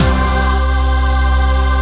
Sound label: PAD; PADS 2